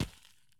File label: soil run; Escape from Tarkov